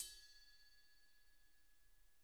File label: RIDE, Interactive Drum Kits